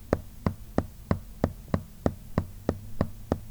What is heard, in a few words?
footsteps